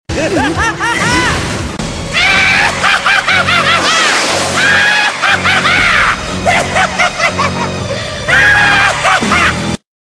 Hollow Laugh

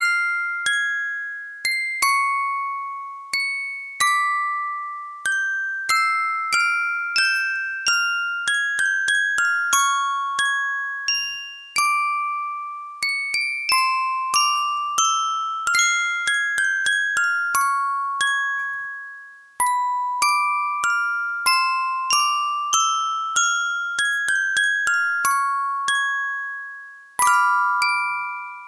Music Box Intro

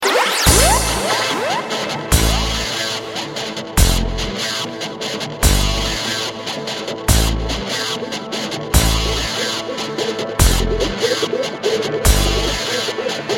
Heavy Guitar

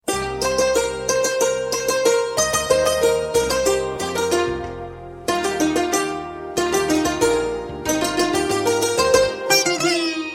Piano Melody

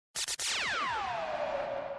Sci-fi Shoot

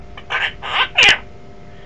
Quaker Parrot

